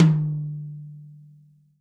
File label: TOM, Real Drum Kit